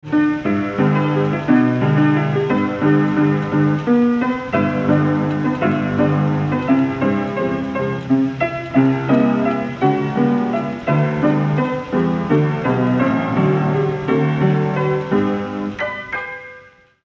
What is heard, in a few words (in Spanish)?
pianola